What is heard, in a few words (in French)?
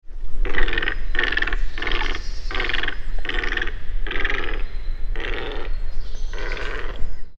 Grande aigrette, Etang; MixPre; Dodotronic; Etang neuf St Nicolas les Citeaux